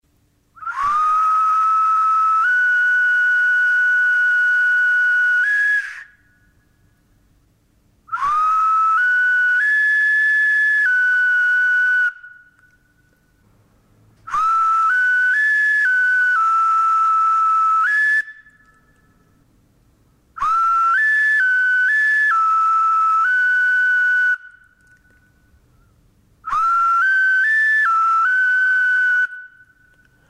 precolombino ocarina arica; musica prehispanica de Chile

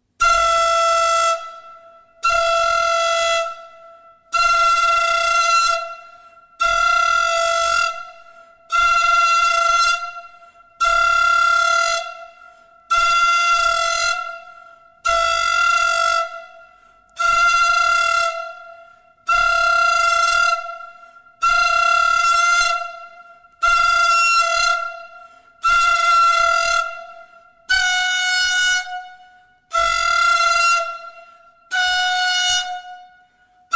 flauta mapuche, musica prehispanica de Chile

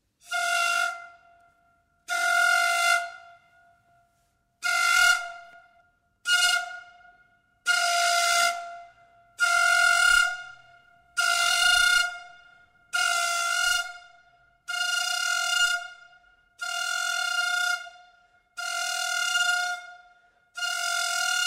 antara diaguita, musica prehispanica de Chile

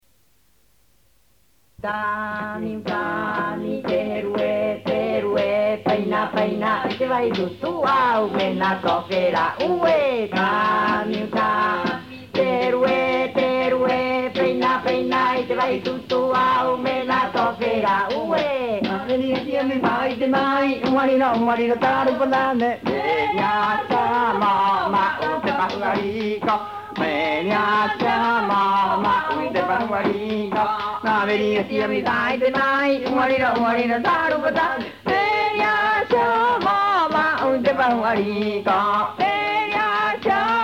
rapa nui utami, musica y danza

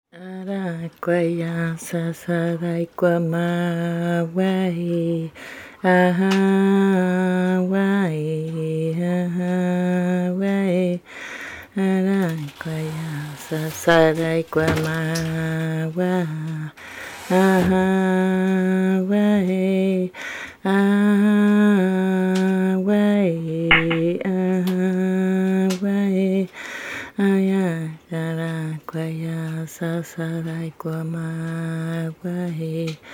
yamana canto chiehaus

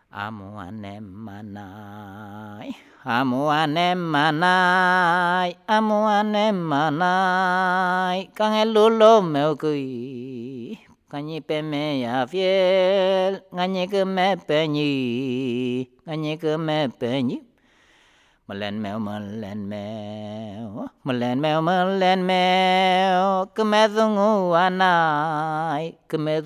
mapuche canto

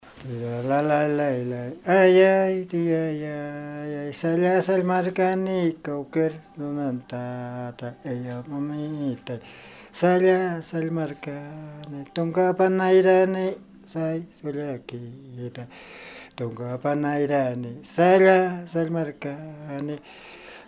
aymara canto de la papa

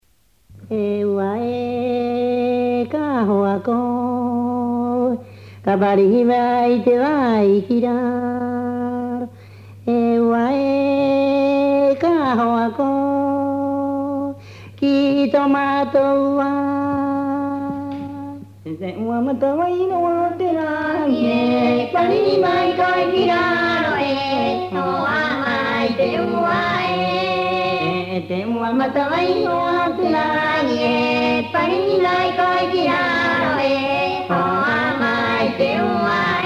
rapa nui riu, musica y danza

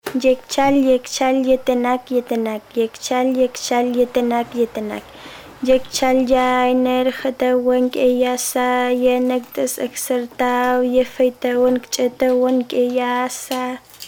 qawashqar cancion del huemul; Kawashkar

